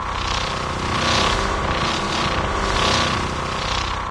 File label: extract loop; ambient; source engine; hl2; citadel